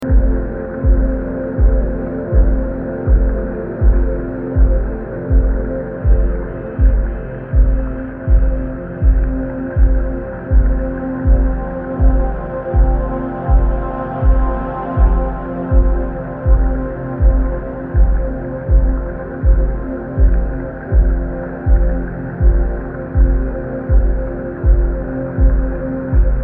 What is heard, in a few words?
low howl dusk